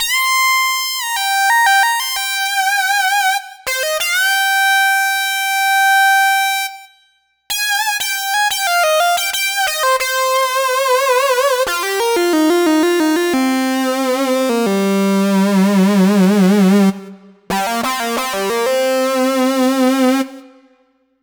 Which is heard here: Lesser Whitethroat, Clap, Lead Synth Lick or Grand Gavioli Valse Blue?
Lead Synth Lick